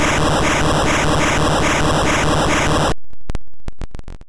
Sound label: Earthquake; Pokemon; Gen 1; attack moves; RBY